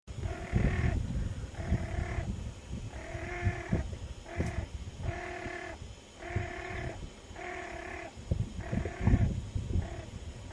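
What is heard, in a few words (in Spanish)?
picazo, Netta peposaca, Rosy- Billed Pochard; cantos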